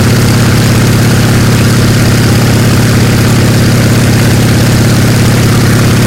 yak side, engines; War Thunder

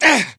melee attack

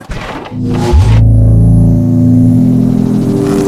biomech air charge

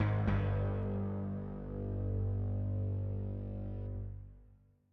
music interactive; World of Tanks

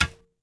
Staff Dirt; Civ4 warlords

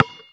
basketball dribble; Call of Duty Modern Warfare

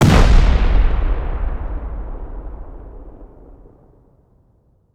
c4 explosion; Rust

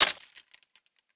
door chain link close end; Rust

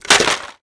fence light wood; World of Tanks Blitz

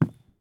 walk wood; Escape from Tarkov

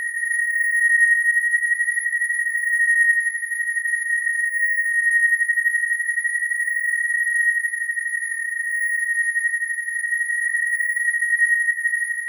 Aircrafts Engines Mi8 Whistle; DCS

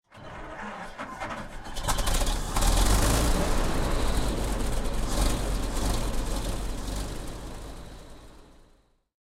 m1 engine start, engines tanks; War Thunder

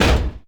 N37 Arming Off; Weapons; DCS

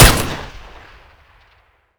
protogun 1shot

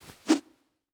spear throw; Rust

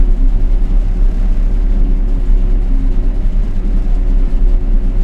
blenheim cockpit, engines; War Thunder

